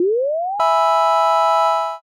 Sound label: revive